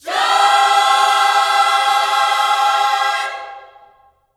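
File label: JOY C major, Vocal Gospel; Spectrasonics; Vocal Planet Vol.1 Gospel; akai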